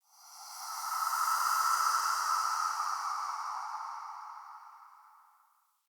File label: Under City Spirit Gust; Ambience